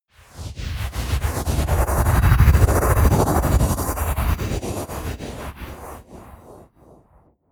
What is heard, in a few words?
whoosh flutter